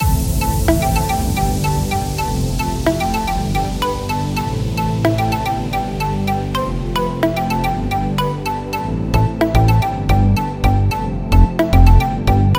Full Loop A

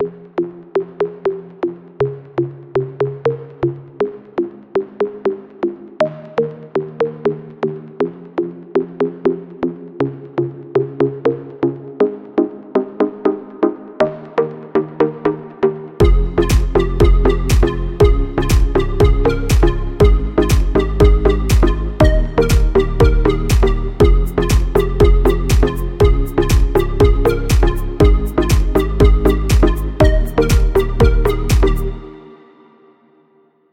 Full Loop F

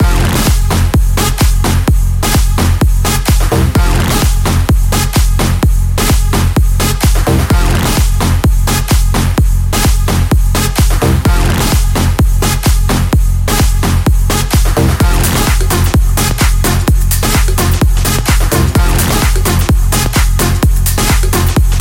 Full Loop F

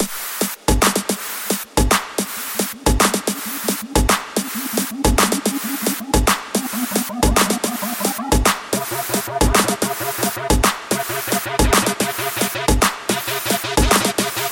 Kit Loop Full

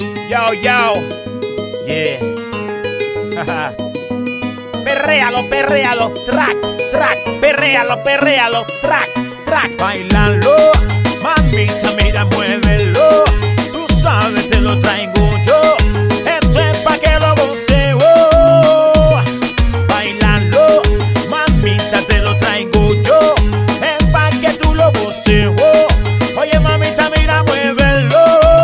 Kit Gs Full Loop 95BPM

